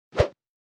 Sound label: swoosh